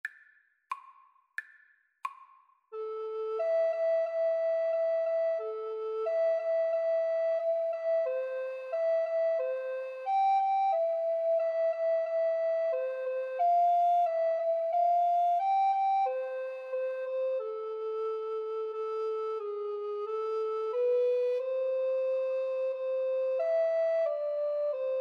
pavane alto recorder, Alto Recorder Duet; Warlock, Peter

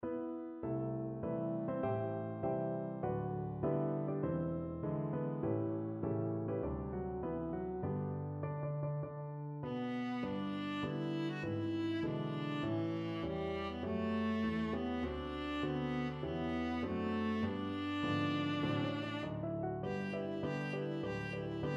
viola

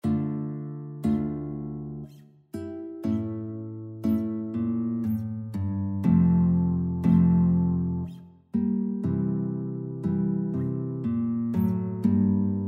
Sarabande, guitar; Handel

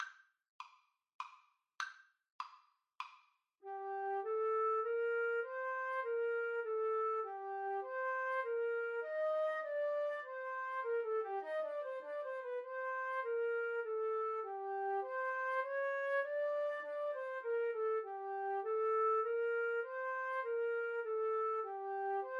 Minuet flute duet

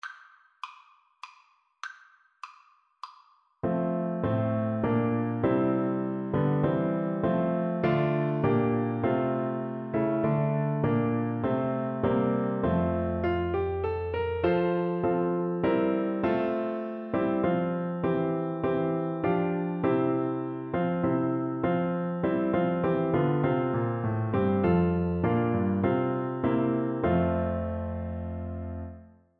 god save the queen, Bassoon

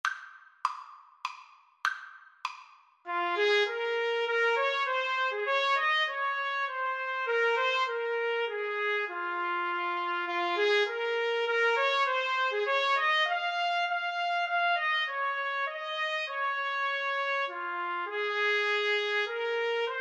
trumpet duet

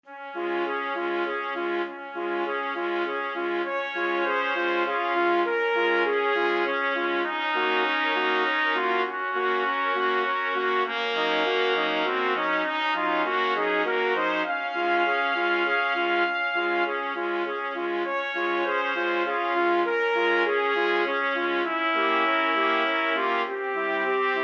swan, trumpet quartet

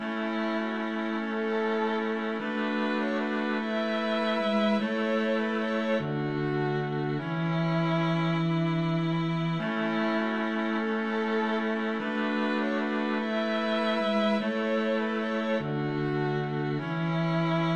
string quartet

